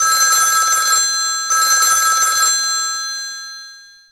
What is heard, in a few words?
Bell; Samsung; SGH-U700